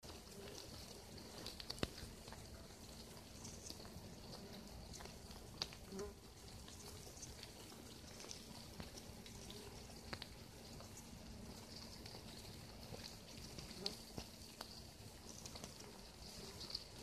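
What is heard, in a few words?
sounds of anthill in forest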